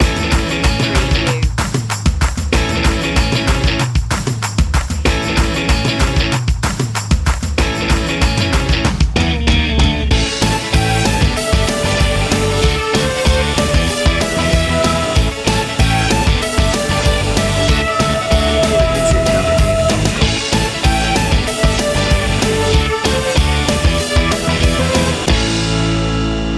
energetic indie rock

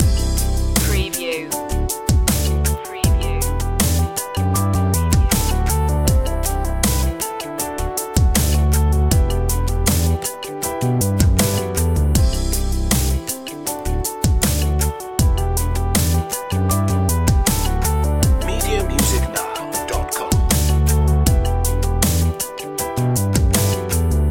progressive dubstep loop

